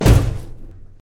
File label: Mech Stomp; lethal company; ambience; windows